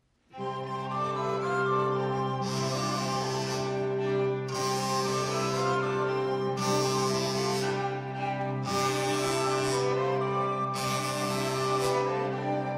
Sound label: hled male jezulatko; Přišel nám den veselý